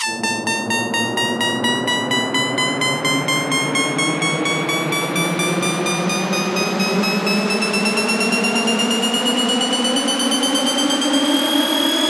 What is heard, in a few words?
Riser - 128 BPM A; House; FX; Risers; Point Riser